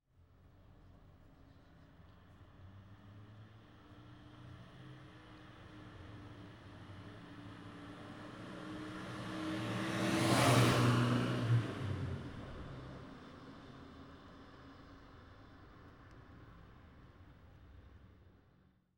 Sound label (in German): pkw opel faehrt an; geraeusche digital